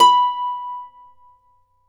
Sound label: Celtic Harp, Plucked Strings